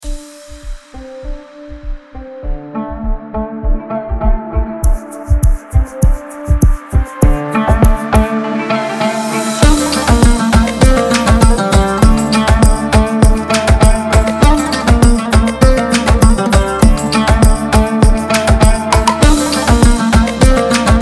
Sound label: music